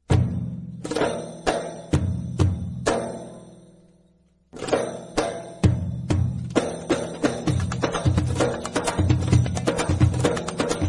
Percussion instrumental ringtone; ringtones

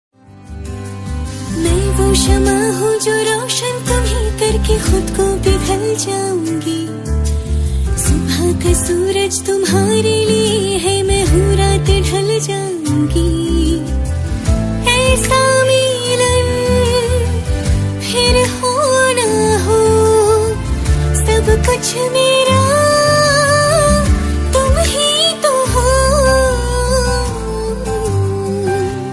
Female Ringtone

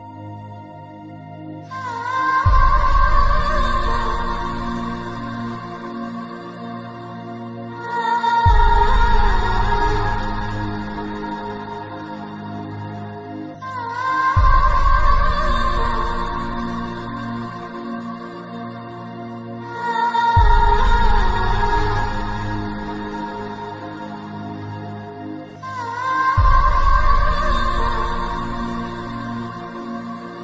Sad Background Music Ringtone; ringtones; Ek Villain

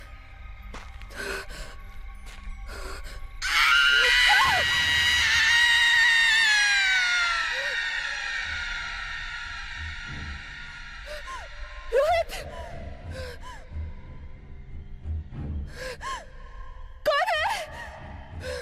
Horror Ringtone; Raaz Movie

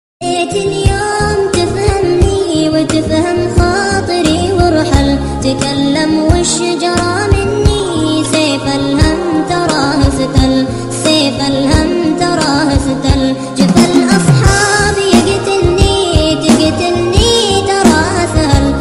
Turkish Trending Ringtone; ringtones

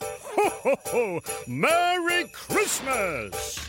Merry Christmas Ringtone; ringtones

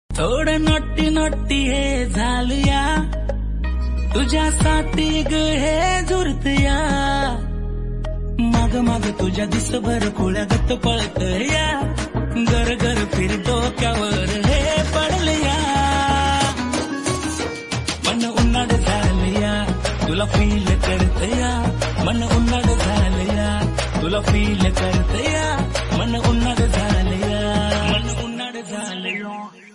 Marathi Song

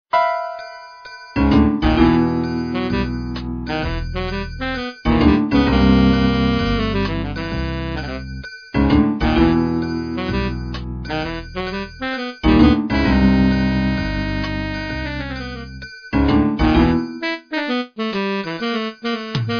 Game Music Ringtone; ringtones

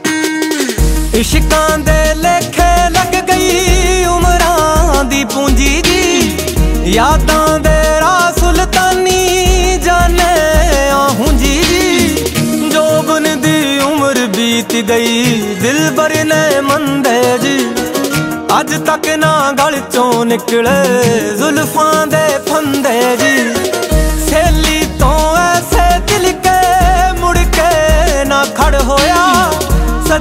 Sad Punjabi Ringtone

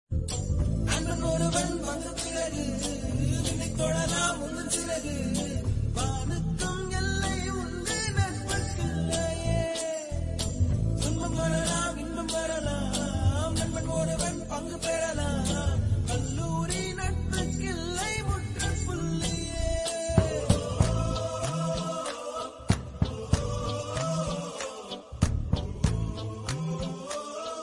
Tamil Movie Song Ringtone

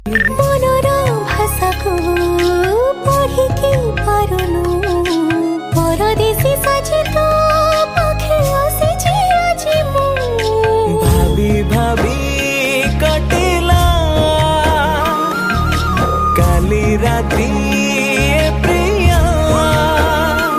Odia Song Ringtone

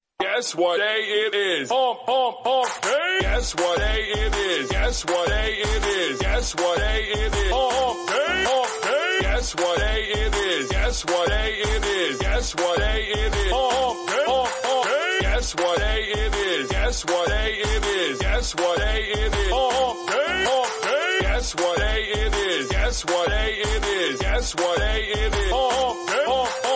Song, English Ringtone

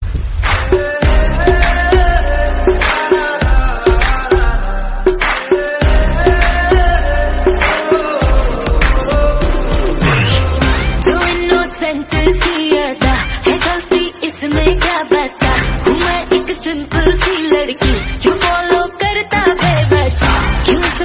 Female Song Ringtone

